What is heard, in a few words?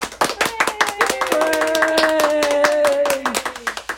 Applause